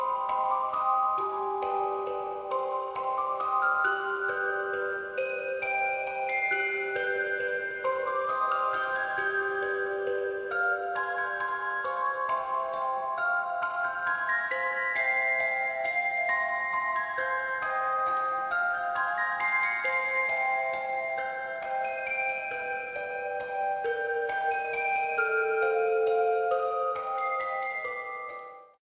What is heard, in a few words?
Music Box